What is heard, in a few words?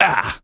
augh; Postal Dude Voice Lines